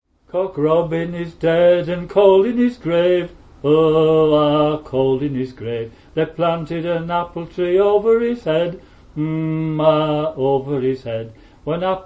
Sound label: Cock Robin, folk song